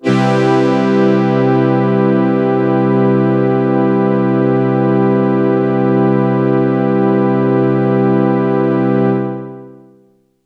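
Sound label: CZ-1 strings major, synths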